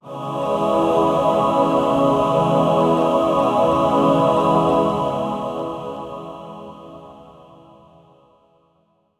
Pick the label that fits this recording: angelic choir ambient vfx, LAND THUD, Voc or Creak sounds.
angelic choir ambient vfx